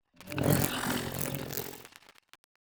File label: greetings arthopoid special; Stellaris